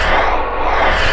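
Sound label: force strength loop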